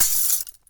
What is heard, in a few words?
glass crack